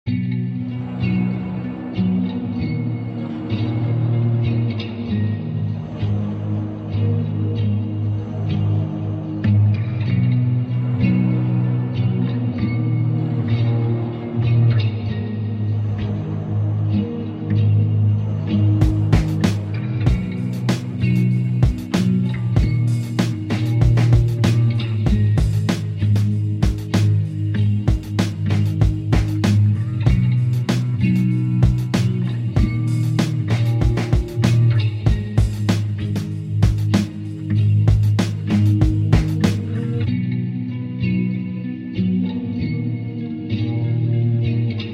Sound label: sad indie trap beat 96 bpm